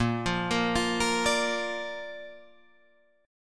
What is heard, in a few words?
A sharp Major, Guitar, Drop D